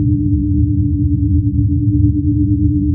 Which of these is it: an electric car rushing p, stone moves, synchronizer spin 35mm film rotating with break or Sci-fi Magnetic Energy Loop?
Sci-fi Magnetic Energy Loop